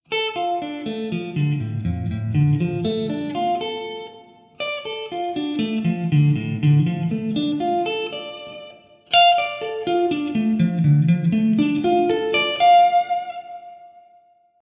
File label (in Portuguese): Montagem de Acordes Arpejo Triade Menor